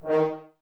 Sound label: brass, Brass Ensemble, F.Hrns; Sample CDs; Miroslav Vitous; Woodwind Brass Ensemble; F.Hrns Links